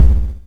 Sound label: Electron Sidstation, Drum Machines